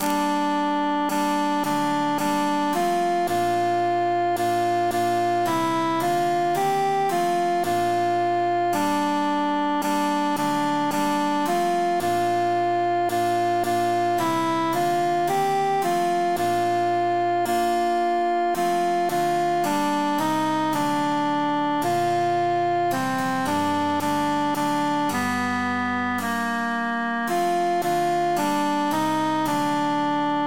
altus emphasized; belle qui

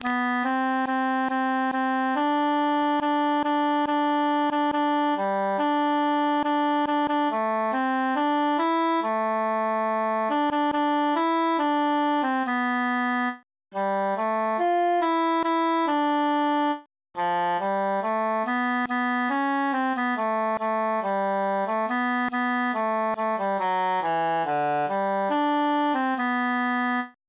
quintus solo; now is the month of maying

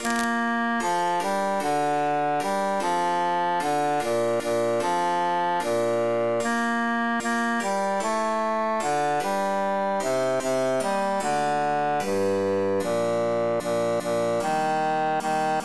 bassus emphasized; fa una canzona

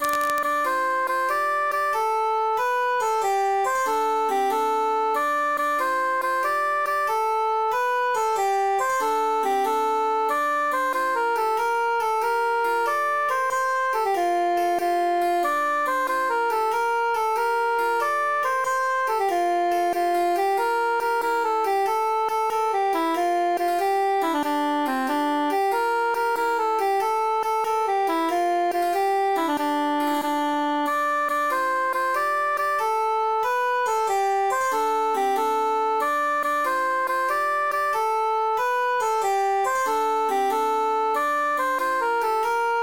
altus emphasized; tempus transit

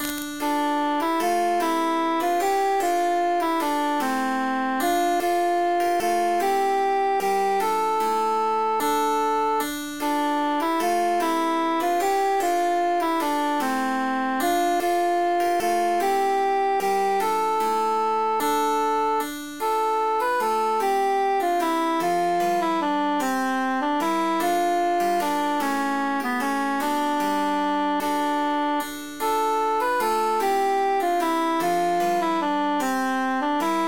three country dances transposed tenor emphasized

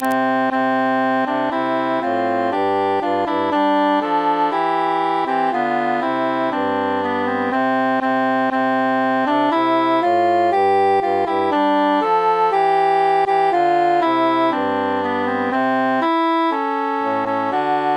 tenor mute; since first i saw

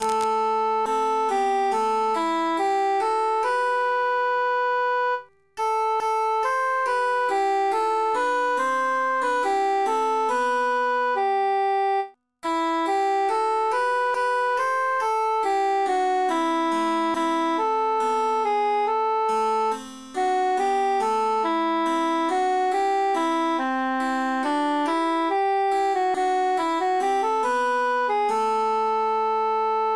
transposed cantus emphasized; now wolde y fayne

